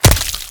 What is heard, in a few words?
kill, gun